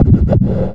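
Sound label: scratch fx left; Big Beat Samples & More; AKAI; SHORTFX KIT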